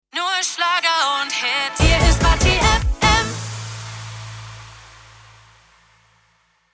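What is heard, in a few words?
Sung Jingle, 147bpm A Major, Sting; Batti FM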